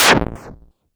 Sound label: pusher; phaser